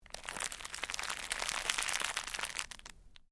Cellophane crinkle

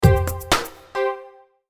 Musical Stab Beat Keys; Stabs; Blastwave

